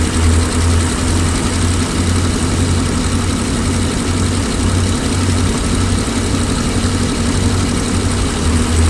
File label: m5a engine rpm 99 load, tanks; War Thunder